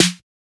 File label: Snare; FLPRO; Snares